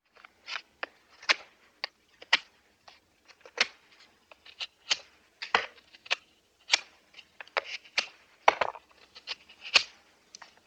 Strogajut drevesinu

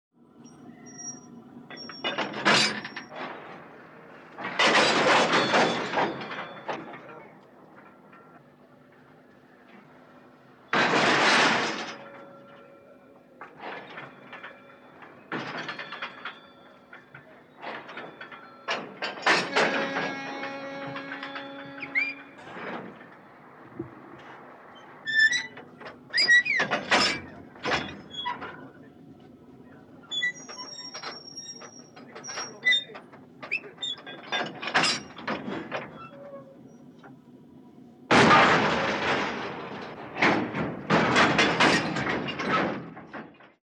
Vagony scceplyajut

